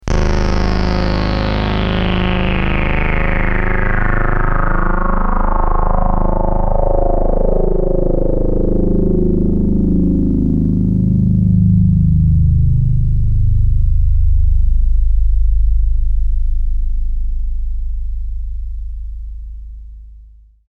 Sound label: Low Intents With Fade, Drones; RoosterFX